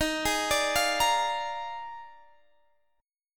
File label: Eb Major 7th Suspended 2nd Suspended 4th Eb Guitar Half Step Down; EbM7sus2sus4